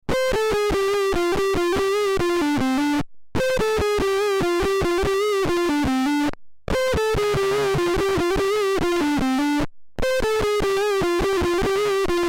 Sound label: Swarm Fuzz Pedal Worker; Beetronics